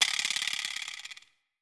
Perc Rattle New Year, One Shots, Drums, Misc Percussion